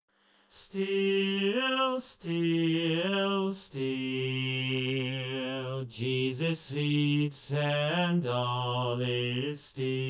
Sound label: Still Still Still Tenor only; Summerwind Ward Choir Practice